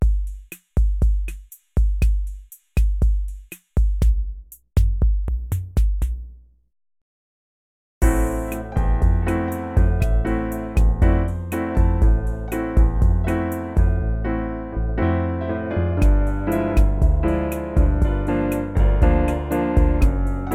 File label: bossa